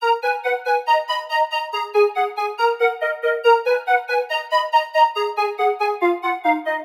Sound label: Melody D# 140, Melodic Loops, Color Bass; Ghosthack - Color Bass Essentials